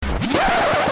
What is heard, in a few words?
Record Scratch